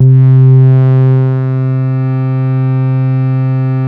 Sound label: PHAT BASE, BASSES; Masterbits; Vintage Voltage